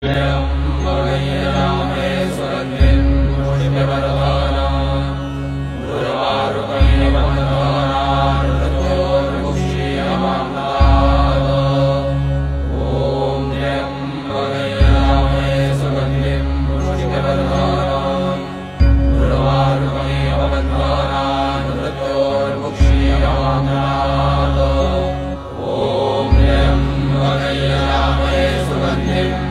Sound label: Mahamrityunjaya Shloka